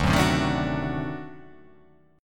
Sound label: D 7th Flat 9th D down, chord